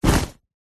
luggage bag rucksack set down carpet; zapsplat

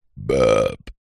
human burp the word burp; zapsplat

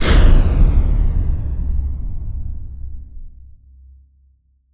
Impact Boom Flanged; bottlerocket

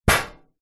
impacts metal tin lid hit loose thud; zapsplat

